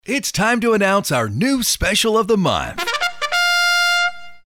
trumpet

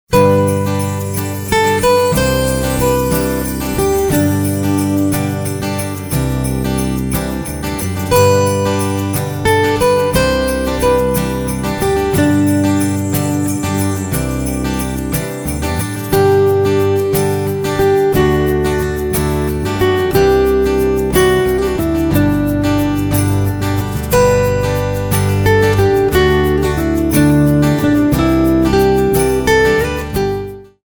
Uplifting

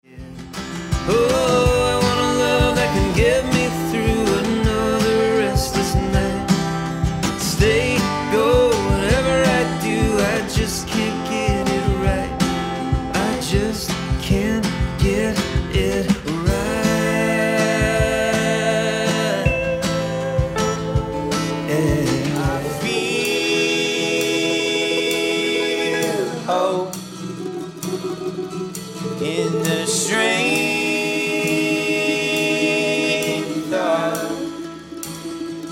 brite acoustic

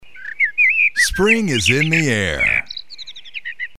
birds

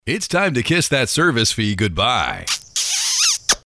kiss

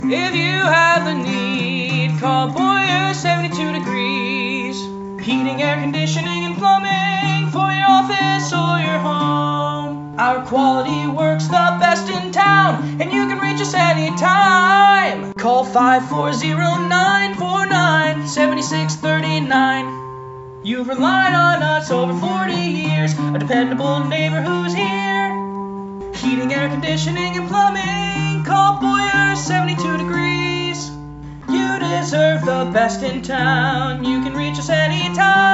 guitar

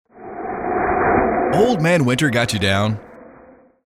wind

